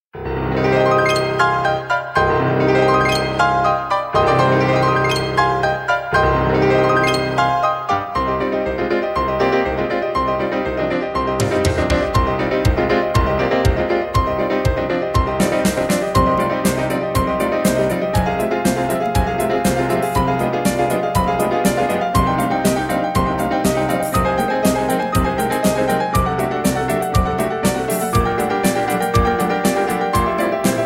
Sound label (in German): C-moll Praeludium